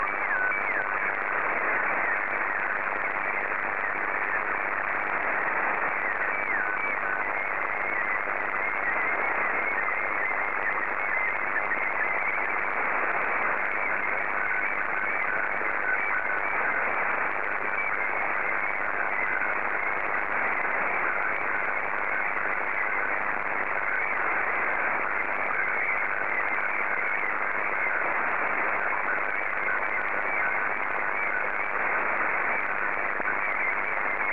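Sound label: Martin 1, slow-scan television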